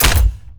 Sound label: p atk dartpistol